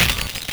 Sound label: Glass Smash; Sonic 3; Carnival Night; M8; Genesis